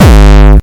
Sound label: Bassdrums Hard Kicks; Vengeance Samples